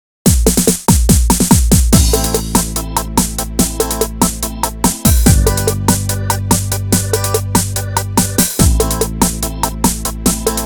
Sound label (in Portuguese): arrocha dance, yamaha